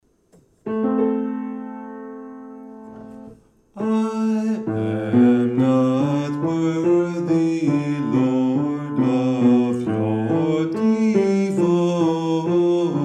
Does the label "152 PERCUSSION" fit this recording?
No